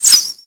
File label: Arrow Whizzby; Warframe; Bullet Impacts; Whizzbys